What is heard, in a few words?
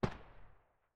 ump45 silencer mono far